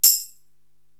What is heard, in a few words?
Tambourine Acc., Korg TR-Rack